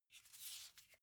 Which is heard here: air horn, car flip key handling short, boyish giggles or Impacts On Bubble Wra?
car flip key handling short